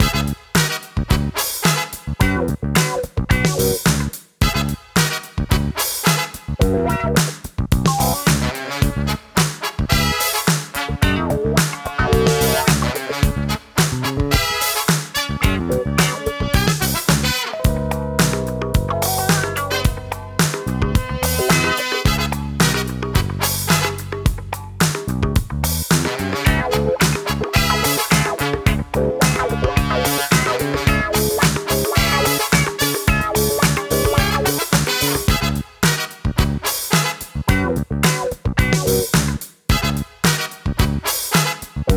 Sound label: Funk; mister mosquito